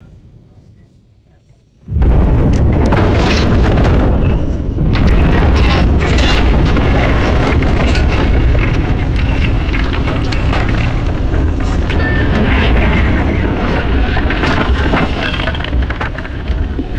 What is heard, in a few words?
massive ship grinding and buckling; destruction